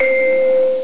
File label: ding